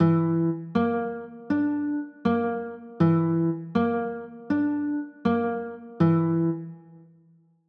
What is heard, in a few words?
e 7 sharp 9, chords; e 7 sharp 11